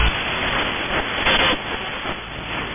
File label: Radio Noise